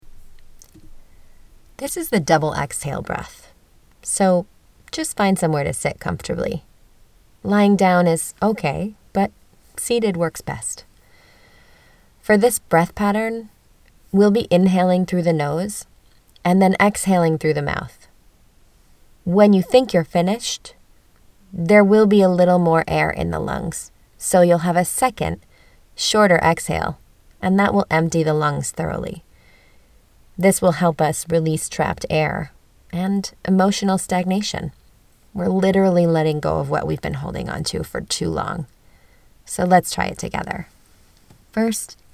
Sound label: double exhale